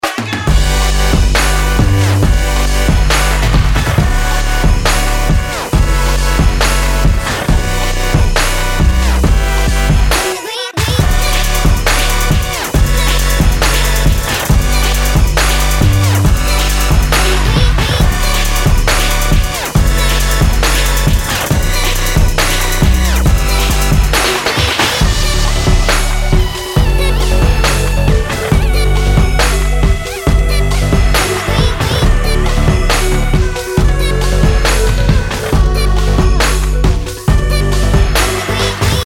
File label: trap remix